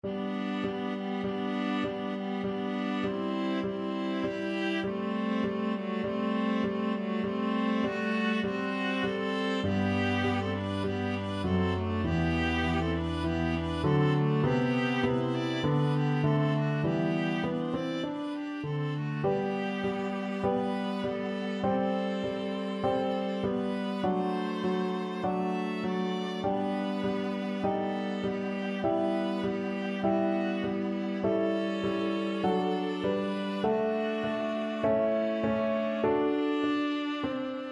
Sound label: VLA, viola duet; bach sheep